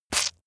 leather impact mud medium squeeze

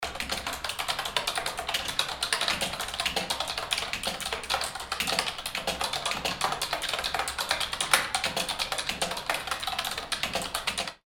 computer keyboard

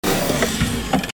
electric door

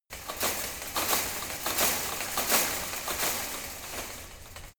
metal fence rattle light

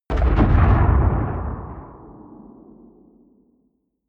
bomb rumble distant

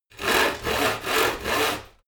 sawing

